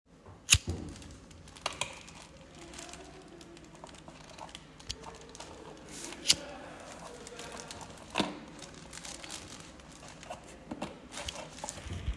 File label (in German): Kaminfeuer